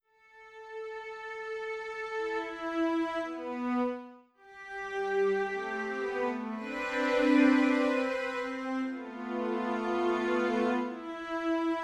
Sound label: cellos 110bpm